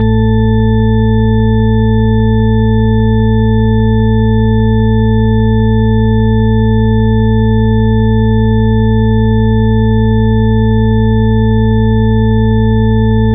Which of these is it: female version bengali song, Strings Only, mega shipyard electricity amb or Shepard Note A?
Shepard Note A